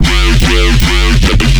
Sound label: BASS SHOT G; The Worst